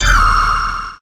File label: Pickup Scifi; SFX Kit